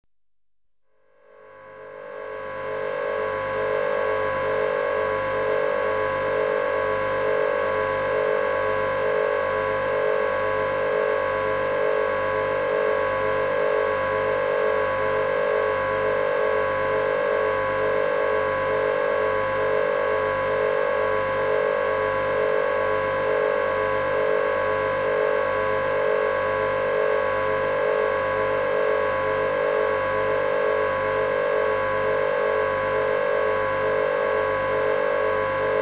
High Pitch Machinery, Electronically Generated Sounds; BBC Sound Effects Library